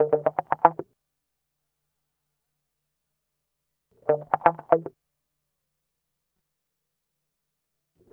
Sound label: guitar, stringed instruments